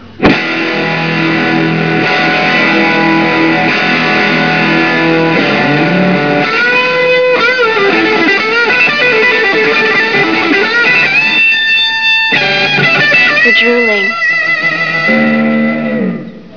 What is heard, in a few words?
drooling